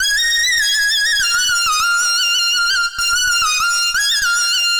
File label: Keyboard, Soul; Keys; Sonic Foundry; Infinite Soul